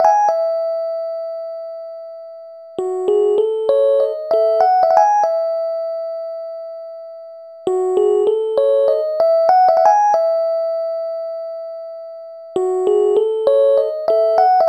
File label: Electric Piano; Club Joints and Street Anthems